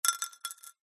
metal screws several small drop or set down on concrete paver